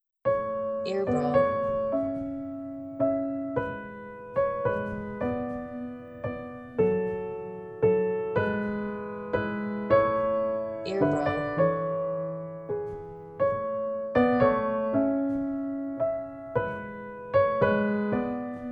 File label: Piano Lullaby